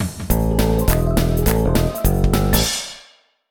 Barrel Blast Jungle - Clear; Mini Mario & Friends: amiibo Challenge

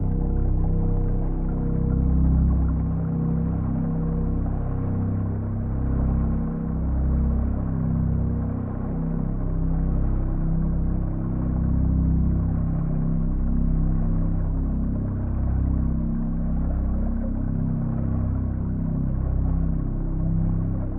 Forest Drone; Foregone; iOS, PS4, Switch, Windows, Xbox One

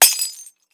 Hit Cera; Puddle Plus

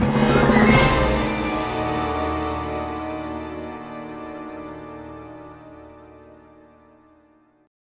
Lose; Star Wars Obi-Wan; Saberarena

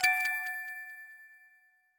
Crystal chimes; super mombo quest

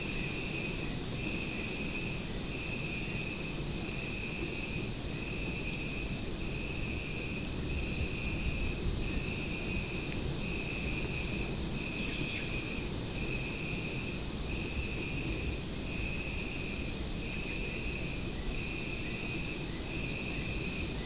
Ambience Desert

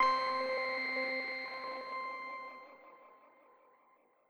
chime; chime sharp

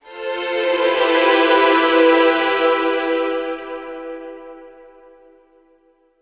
Violin Chord End; ps vita; Garamond

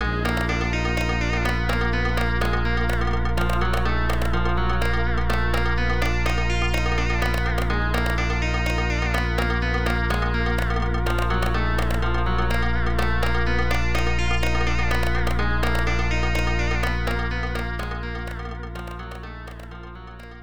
Music (Sitar); Quiz Gakumon no Susume; arcade gamerip 1993; Music 13 (Sitar Maharaja)

